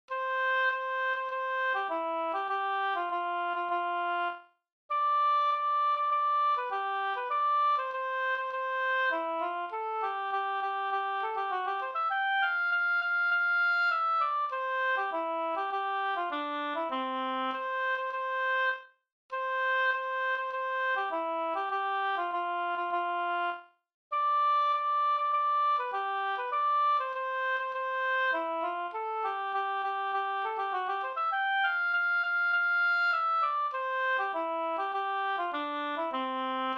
brudmarsch C-dur

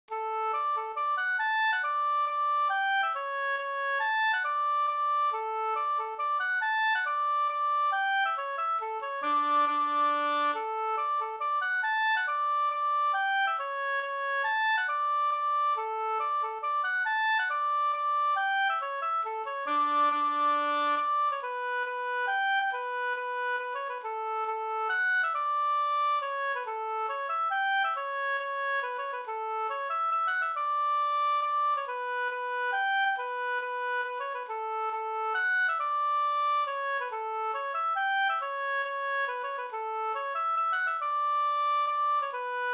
hambopolska; Fiskarns polska Sd

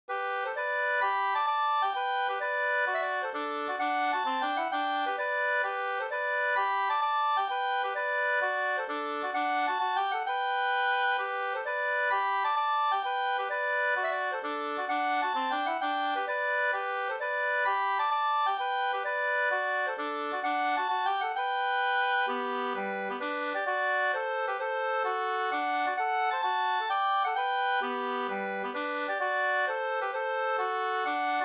hambopolska

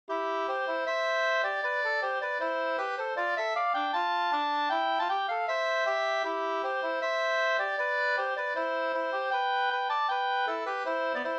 schottis; Reglandarn e Helfrid Andersson Morko Sd 2st V1

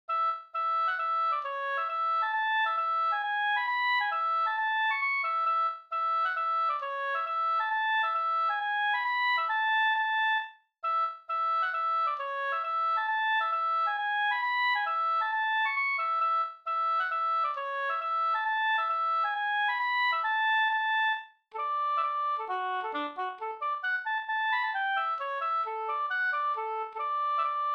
hambopolska

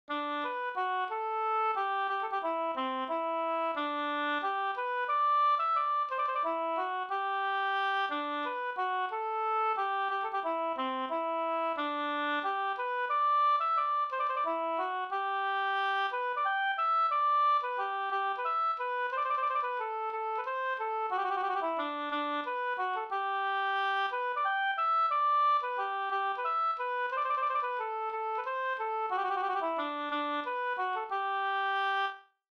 vals, G dur

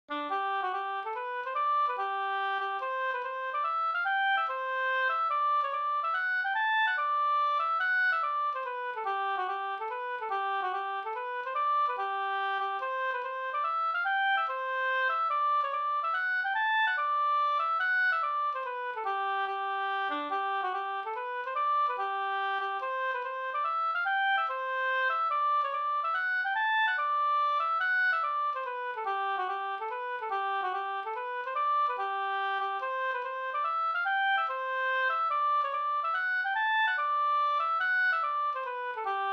mazurka; Soderbarke brannvinslat Da

